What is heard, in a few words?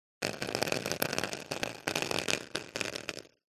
nature water drips sudden short plastic sheet